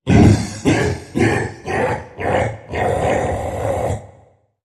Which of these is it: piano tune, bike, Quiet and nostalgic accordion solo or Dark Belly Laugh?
Dark Belly Laugh